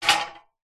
industrial sack truck trolley metal stand upright gently concrete